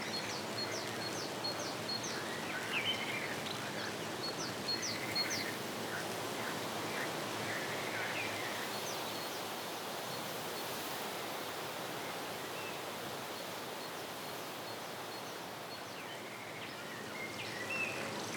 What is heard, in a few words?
Exterior